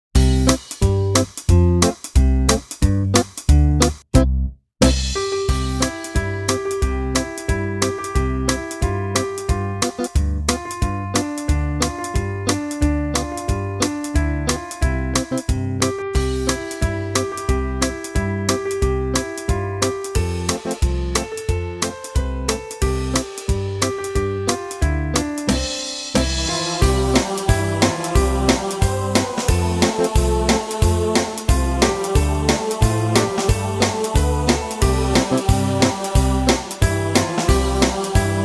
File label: Melody